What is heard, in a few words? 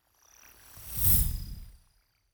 fly by insect drone long; swooshes-swishes